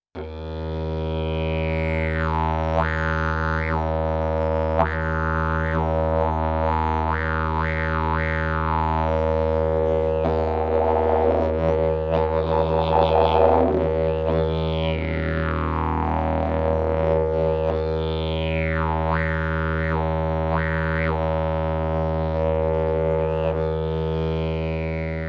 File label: didgeridoo; olse strom